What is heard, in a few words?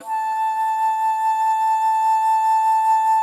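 A5, bass flute; Xsample VOL-2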